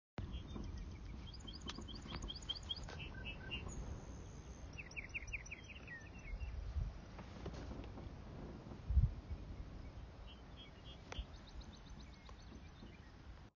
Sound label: Lark Bunting